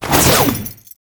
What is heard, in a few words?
Ninja Teleport Jump Foley; Tau Dax